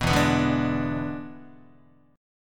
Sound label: E 7th E down Guitar; E7 strummed; JGuitar